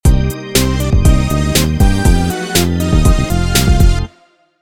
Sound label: Electronic